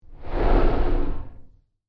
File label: Monster Yeti Breathe, Horror Monster Yeti Breathe Creature; Monsters